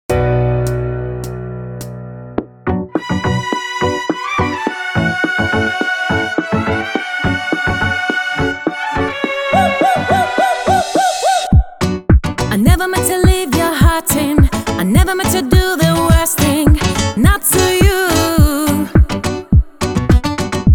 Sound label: solo